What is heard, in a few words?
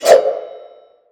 star jump; netent